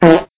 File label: fart; pikapoo